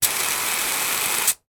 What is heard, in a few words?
household polish furniture spray long; sound effects one